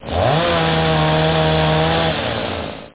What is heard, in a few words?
CUT